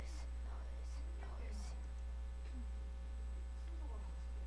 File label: noise